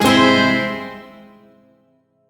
Shawzin Phrygian Chord; Warframe; Duviri

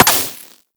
Laser Impact Metal; Warframe; Weapons; Bullet Impacts

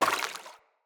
Grunt Step Water, footsteps; Enemy; Characters; Corrupted Sproutfolk

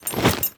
Generic Foley; Warframe; Temple

